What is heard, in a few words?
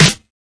small reverb acoustic snare g sharp